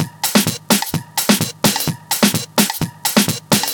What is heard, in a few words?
Percussive Loops 128BPM; Vengeance Samples